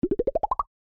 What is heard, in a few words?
start roll